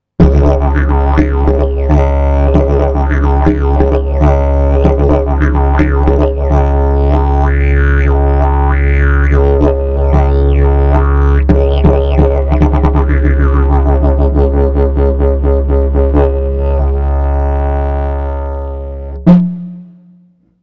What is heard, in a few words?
groove